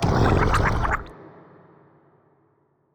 scream gurgle lo

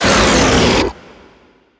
beast vocal fire barf

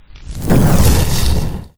flamethrower start

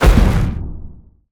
black heart burst

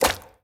bounce medium; fistula

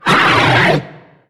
small growl; steven

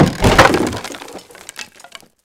wood plank breaks

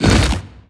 bighorn small hole open

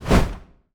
angel wing

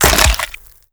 mushroom break

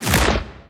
bighorn small hole close

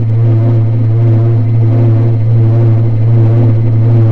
portal loop

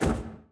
chase flap; fallen

